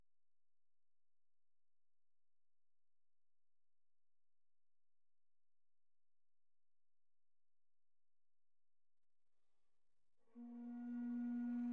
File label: SCALES